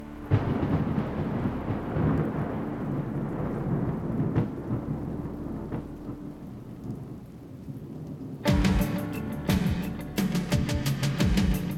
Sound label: GREGORIAN